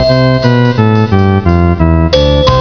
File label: Tada Tada Melody